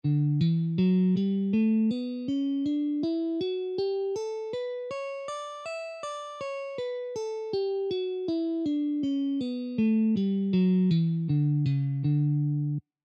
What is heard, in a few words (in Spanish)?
escala de Re mayor posición 5